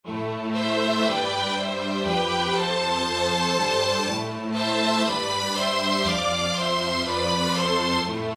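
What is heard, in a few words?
strings Golden, E-mu PK-6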